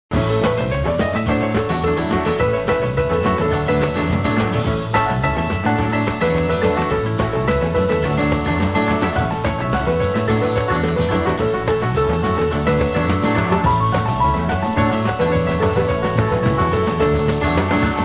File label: Piano Solo